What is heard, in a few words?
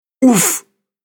Human Fx Grunt; Andrew Fleming